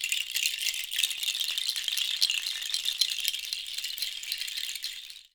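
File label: ankle rattle, African shaker; Voice Crystal - Steve Reid Definitive Percussion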